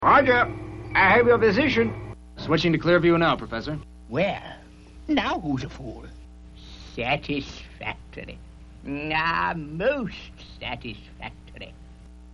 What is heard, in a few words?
mono vocals